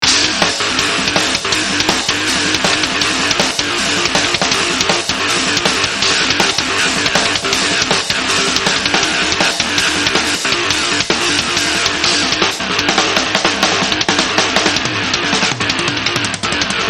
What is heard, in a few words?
Drum n Bass